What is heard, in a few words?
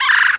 red bellied woodpecker, bird calls